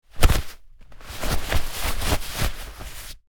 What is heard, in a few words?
Towel Grab